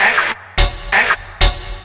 Synth, Techno

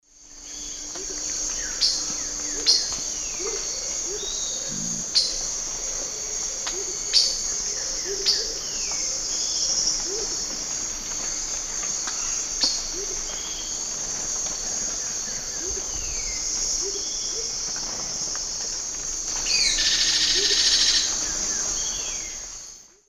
Cissopis leverianus, call and song; S. America; Eastern Lowlands

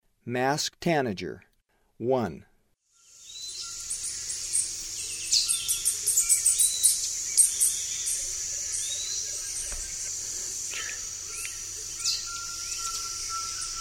Masked Tanager; S. America; Eastern Lowlands

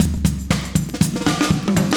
120bpm loops; Sound Effect Library

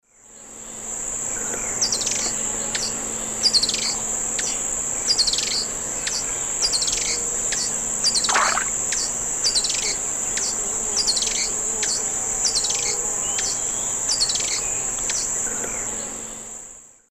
Chloroceryle aenea, song; S. America; Eastern Lowlands

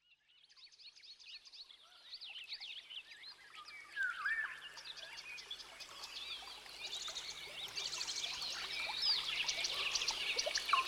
Rainforest Stillness

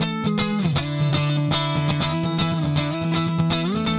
Slinky Melodic Gtr, Rock, Guitars

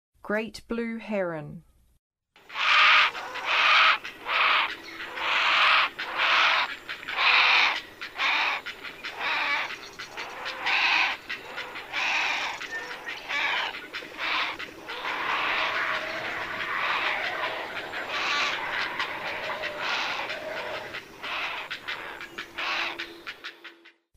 Great Blue Heron; North America; Western USA; Western USA Songbirds

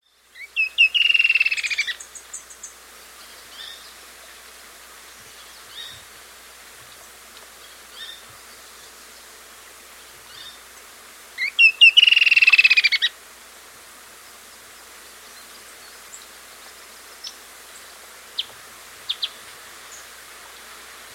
Creamy-Bellied Antwren; S. America

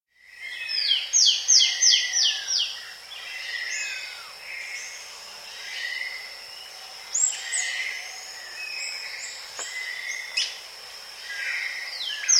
Russet Antshrike; S. America

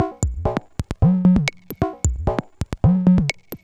Electro Perc, Dance Beats

